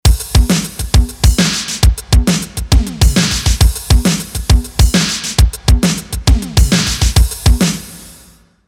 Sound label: Dynachord ADD Drum; Fabfilter R2